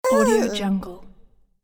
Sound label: cartoon character death